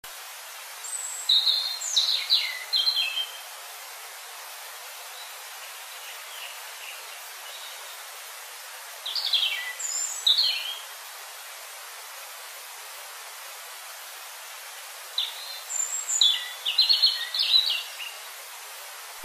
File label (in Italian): pettirosso, canti uccelli; mondo montagna